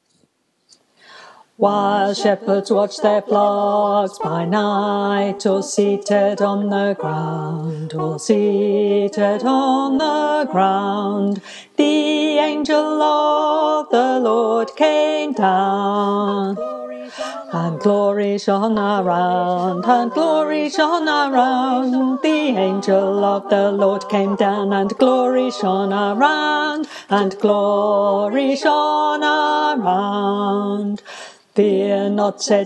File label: Bass, choir